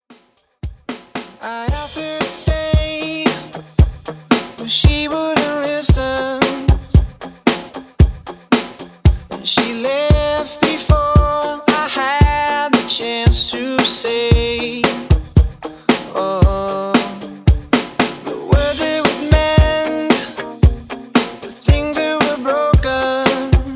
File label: Alternative Rock, 114 BPM